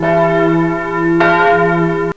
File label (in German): kirchenglocken